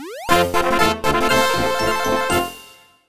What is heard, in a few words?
(Jingle) Practice Catcher - Success; Nintendo Badge Arcade